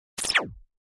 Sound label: Laser Skip Shimmer